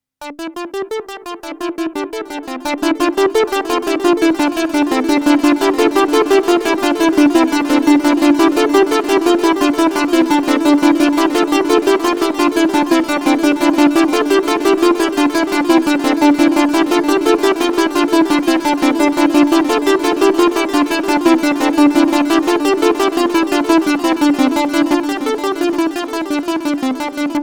Synth Delay; Waves SuperTap

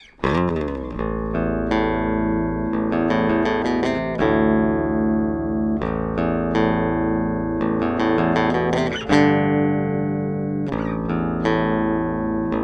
Bridge active midcut consolidated

